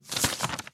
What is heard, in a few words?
tile flip